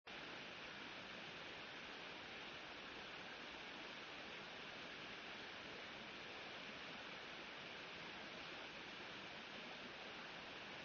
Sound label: riverside